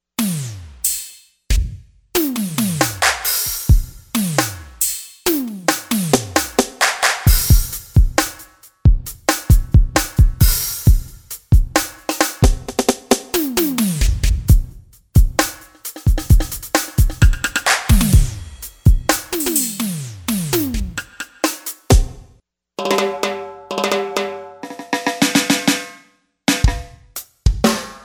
Edrum Regg; SPD-30; OCTAPAD; EXPRESS for SPD-30 (Ver. 2) OCTAPAD

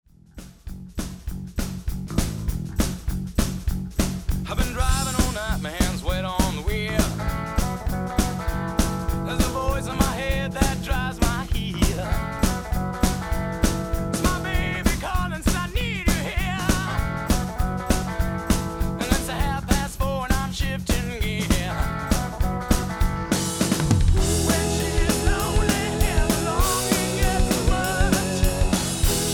smooth wet; surReal

